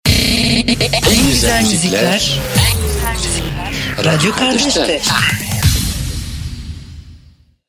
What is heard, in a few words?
Jingle; Radio Kardeste